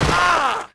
FALL, Rider

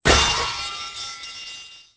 metal fall; Soldier Of Fortune II Double Helix; XBOX

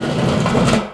slide metal grating

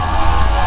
Bolt Travel Loop, Space

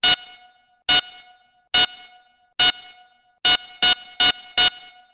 demolition; Soldier Of Fortune II Double Helix; XBOX

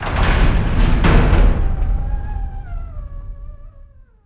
Mourn Gates Open; The Elder Scrolls III Morrowind GOTY; XBOX

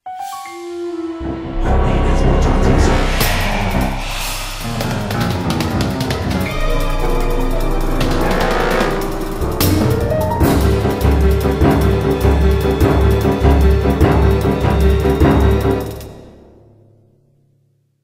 Fight; music; Silas; The Da Vinci Code; XBOX

